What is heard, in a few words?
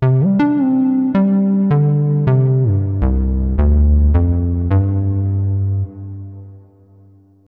SNTH BASS AMBNT 160 C; Ambient